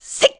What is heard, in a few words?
Link Roll; Link rolling